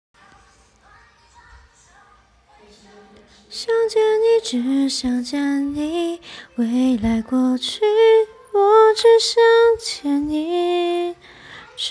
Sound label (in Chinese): 清唱